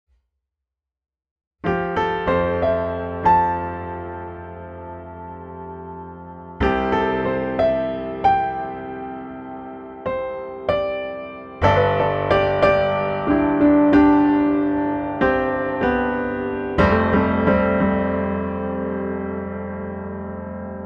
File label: Grand